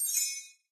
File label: earn Crystal